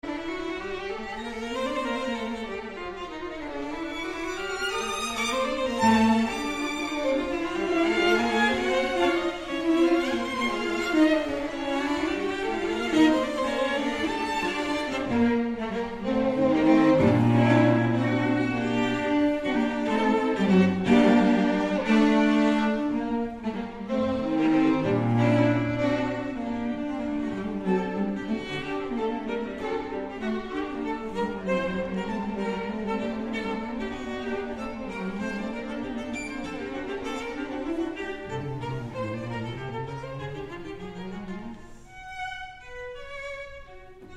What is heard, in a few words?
String Quartet in D minor Allegretto; Jean Sibelius; Op. 56